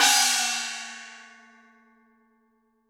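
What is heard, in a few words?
CYMB FX, cymbal; Univers Sons - Raricussions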